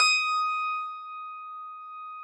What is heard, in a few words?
Harpsichord D4; Classical Prepared Pianos; Best Service ProSamples vol.53; Total Piano